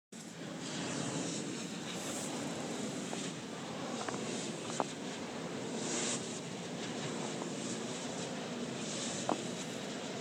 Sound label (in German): windpark